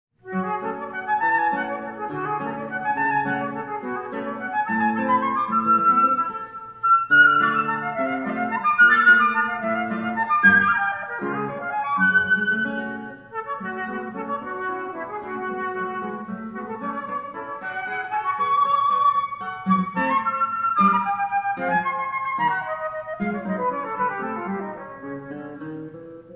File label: Flute Guitar; FluteGuitar3clip